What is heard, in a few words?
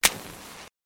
match match